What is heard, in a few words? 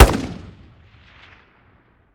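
weapon ar fire player atmo exterior; gmod; viper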